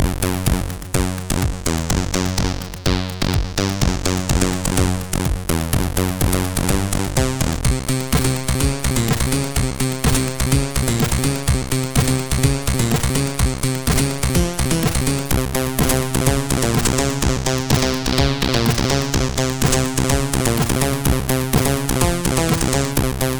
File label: Techno Rave, CSG8580R5